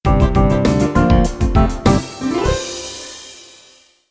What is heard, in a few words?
Stage Clear